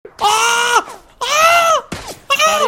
chicken; lirik

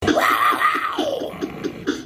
panthers growl

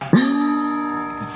ping

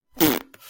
super fart

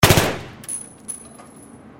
famas burst inside

